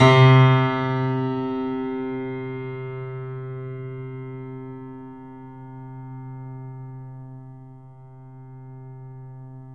The Piano, sustain; YAMA SUSTAIN; InVision Interactive

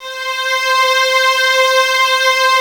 violas C 5, String Ensembles; Miroslav Vitous; VLS 1+2

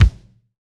St Kick, Roland BK-5; St Kick 1 alt

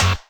Rave Wave

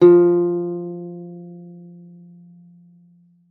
F3, guitar; Xsample VOL-2

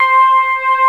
TINE STRINGS

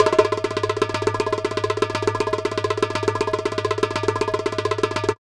Doholla Roll, Percussion

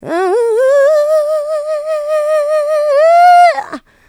Vocals, Adlibs; Houseworx

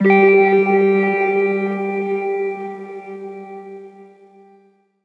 synth note glossy G; Virtual Riot; Synth Notes & Chords

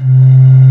BOTTLES C2; Big Fish Audio; Synth City

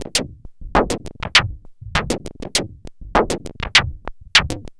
perc 100, Percussive Loops, 100 bpm

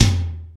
TOM, Kit s; Real-Kit s

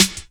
SD, Dance Mega Drums; Best Service

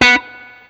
BLU POP, Guitar & Bass

